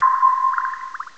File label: sonar ping